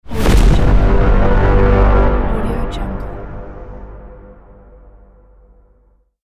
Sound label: horn hit; atikus-studio